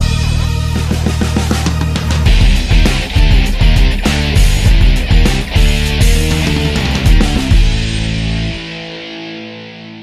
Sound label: Winning; Asphalt 3 Street Rules; mobile windows